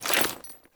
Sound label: Generic Foley; Warframe; Dagath